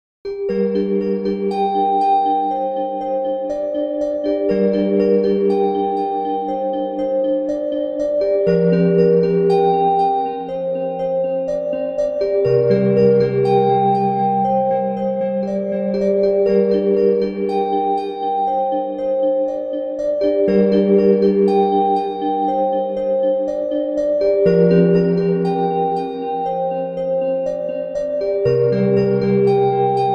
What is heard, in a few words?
ice bells